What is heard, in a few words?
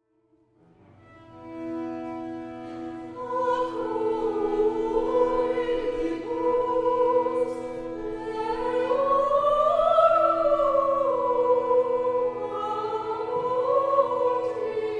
dominican chant; church music; voices of St. GErtrude